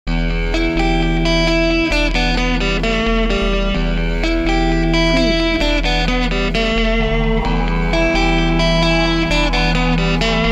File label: bold and determined no drums